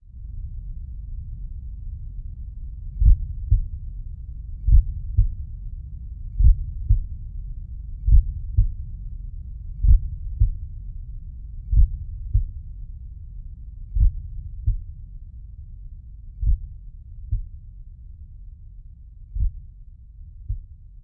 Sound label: HEART; Blind Drive